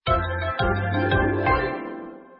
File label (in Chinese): 铁匠 关店结算音乐; Blacksmith